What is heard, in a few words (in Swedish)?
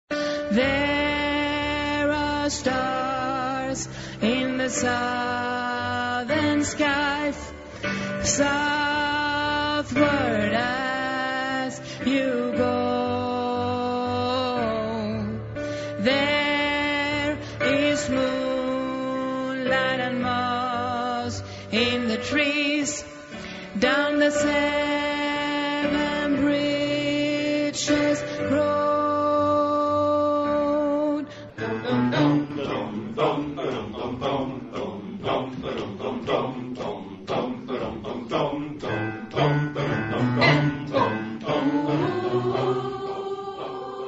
bas